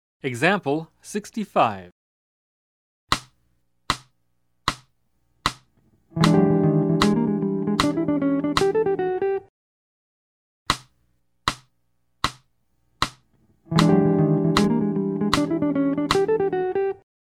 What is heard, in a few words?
Jazz; jue shi ji ta